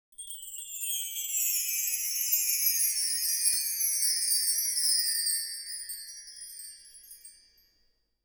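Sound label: wind chime down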